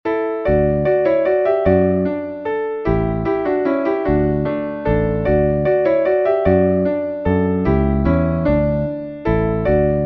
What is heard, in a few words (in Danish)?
Vals